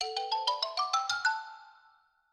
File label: licks